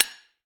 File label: manual repair simple; factorio 2.0.55